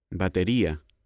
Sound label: musical instruments